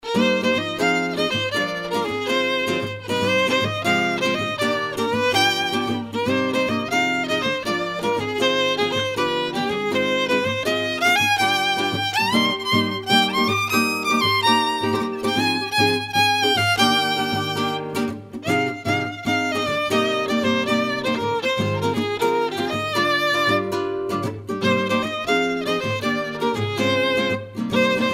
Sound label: latin wave